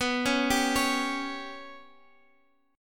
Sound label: B Suspended 2nd Sharp 5th down Guitar Half Step Down